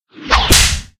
high five; Source Engine; tf

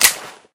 smg slide forward; Left 4 Dead; Source Engine

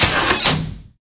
paint switch

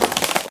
wood strain; Source Engine; video game; physics

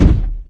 far foot light, antlion guard; npc; source engine; episodic

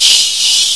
air tank; Source Engine; bshift

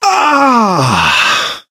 pain relief sigh, survivor voice, manager

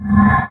menu horror, ui; source engine; left4dead2

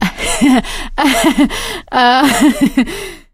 laughter, survivor, voice; source engine; left 4 dead 2; producer

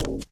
glass, footsteps, run; player; source engine; left 4 dead 2; boomer

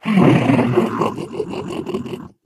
ballbot awww

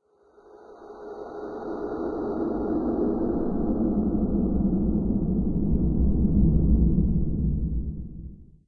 citadel pan, ambient; source engine; episodic; intro

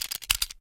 357 reload, weapons; Source Engine; video game

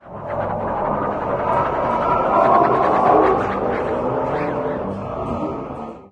strange talk; ambient; source engine; hl2; citadel

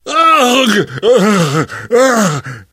hurt critical, survivor voice

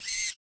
gunslinger inspect finger move, weapons

